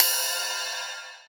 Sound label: Ride Acoustic; One Shots; Drums; Ocean